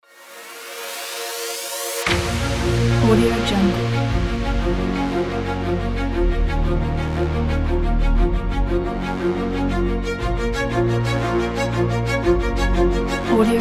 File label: dramatic action sport epic intro kit